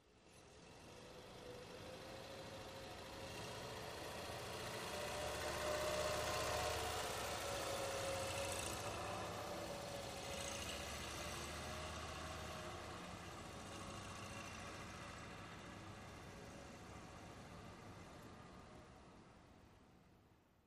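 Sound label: 3 Wheel Scooter By Slow; 3 wheeled scooter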